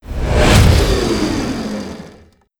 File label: brake; gmod; lfs v wing v 19